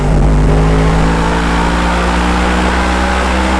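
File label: third, car engine; tdmcars; gtav; adder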